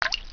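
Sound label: water drip; tnpdemo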